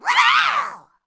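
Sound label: ghost attack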